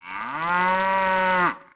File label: cow; Ambient; MX vs ATV Unleashed